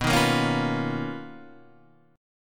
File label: B Major Sharp 11th, Guitar, Half Step Down